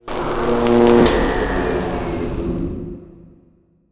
boss disfield

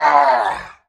hit

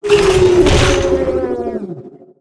swolf death

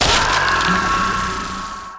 death

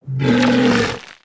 attack

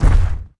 move step; Swampmon; SwampOfDarkness

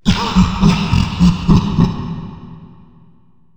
Ghost; Naipin

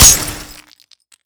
Amoro Ball Knock; Ferea

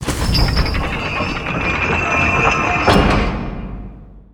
Door Open; Feria

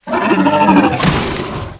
death; ber

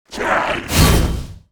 Temple Gremlin attack; Arenill

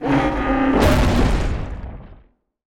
Burning Lava Golem attack

